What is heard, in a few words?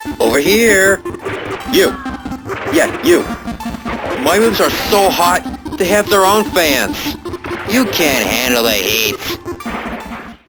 Arcade Machine; Mall; Warframe; TC Demo